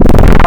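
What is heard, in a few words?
Square Combo; Synthetic's Serum Collection; Cymatics - Morph Wavetables Vol 2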